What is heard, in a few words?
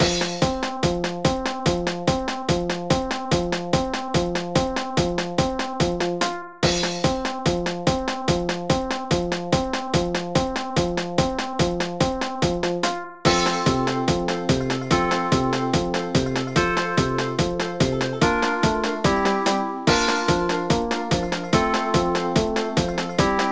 Country Stage Karaoke; pinky street kira kira music night pop town gamerip